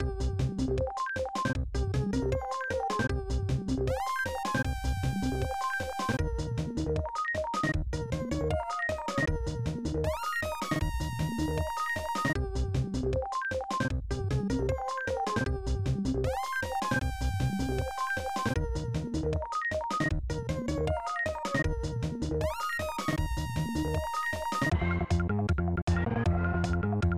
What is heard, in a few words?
Level Music; Clock Works; SNES